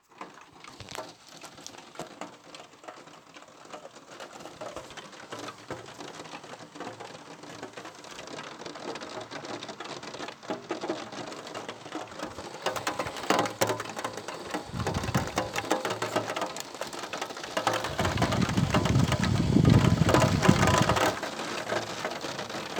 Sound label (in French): Pluie début averse sur velux long; Bruitages